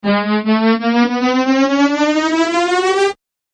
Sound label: Android Building Power, robot; Robot Sound Effects